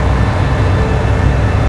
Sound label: red beam, ambience; cairn